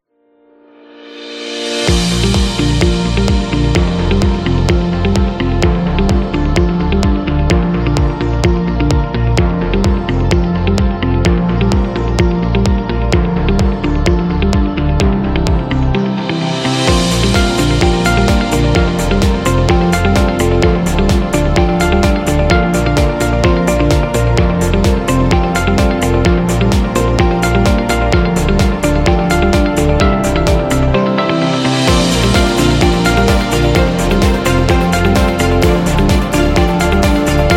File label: A Corporate Happy